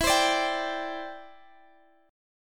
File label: E Minor 7th Sharp 5th, Guitar, Half Step Down